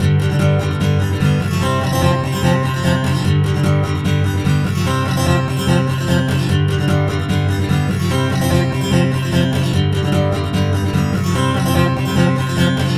Acoustic Guitars; S Doubler On

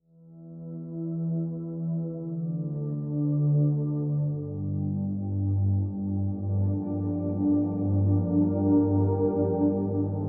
Pipe Organ; labs pipe organ; Requiem

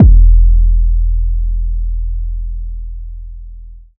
808 - E; Heavyweight; premium 808 sample pack

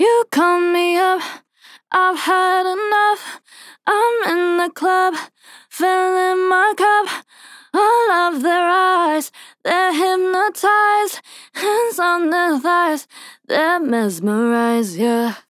Female Vocal; S Doubler Off

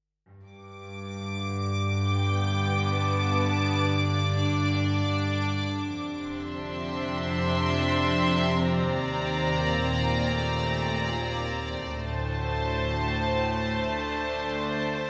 Strings Long; labs strings

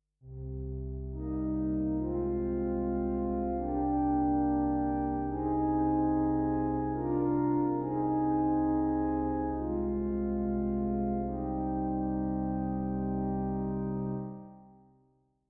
Pipe Organ, Muted Horns; labs pipe organ

